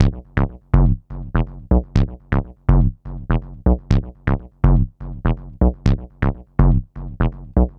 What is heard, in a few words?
Analog Synth Bass, Bass Lines; Sonic Foundry (Sony Creative Software); Electronic Point Blank; Basses